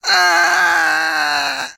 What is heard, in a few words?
death; metrocraft; customnpcs